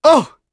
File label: get hit voice; UFC Tapout; XBOX